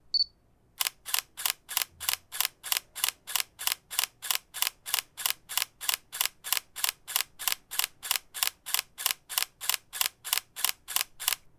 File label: camera cont shoot